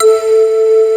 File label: SYNTHESIZER DIGI DECAY; AKAI S6000 CD-ROM - Volume 1